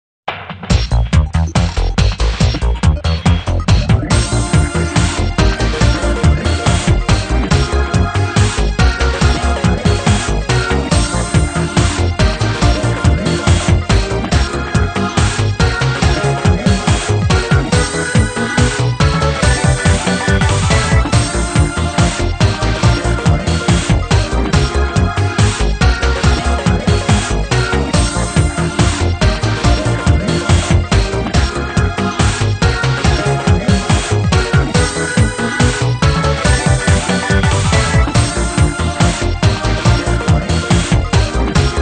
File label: Music Success event